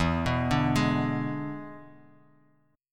E 7th E down; E7 arpeggiated; JGuitar

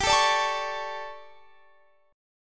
D Major 7th Suspended 4th, chord

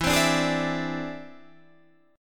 E Augmented 7th E down; E+7; strummed

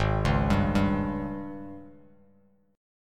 Ab Major 7th Suspended 4th, Bass Standard; arpeggiated; 4,4,5,0; AbM7sus4; JGuitar

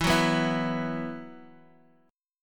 E Suspended 2nd, Guitar Open D; x,x,2,0,2,2; down

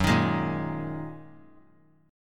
Gb 6th Add 9th Gb down; strummed; Gb6add9; JGuitar

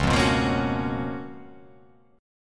C sharp 13th C sharp

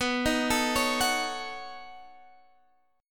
B 9th B down Guitar Half Step Down

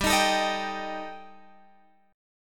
G Suspended 2nd Sharp 5th, Guitar Drop D

